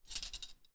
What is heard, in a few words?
Cuttlery, Fumble, Light; benlustedaudio; Kitchen Cutlery